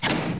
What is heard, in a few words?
bowl fall